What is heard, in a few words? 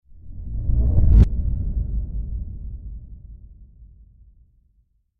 Impact Deep Reversed Air Hit; Hollywood Edge; sound designer's toolkit v2